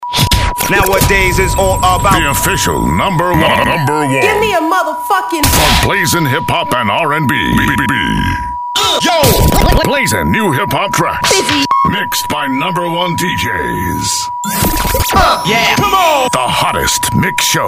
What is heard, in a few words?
HipHopper